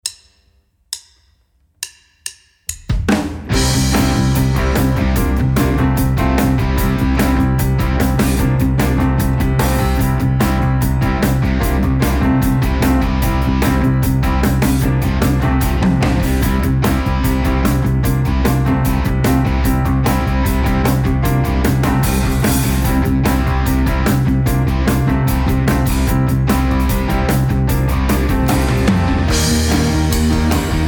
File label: rock rhythm section